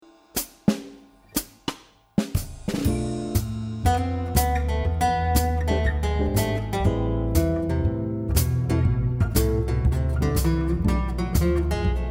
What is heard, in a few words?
Am minor jazz solo, guitar